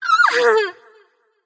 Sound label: yay light; smite; voicepack; hel